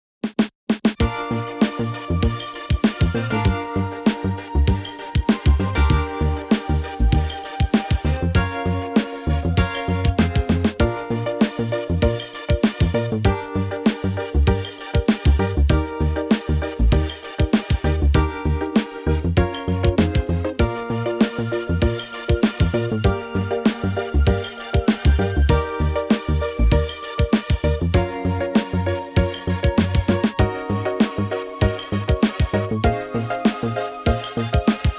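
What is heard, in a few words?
Bb, Backing Tracks for Male Vocals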